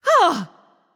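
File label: surprised, female voice; smite; voicepack; hera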